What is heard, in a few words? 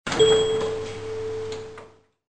bell